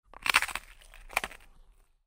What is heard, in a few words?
eat chips; anemoi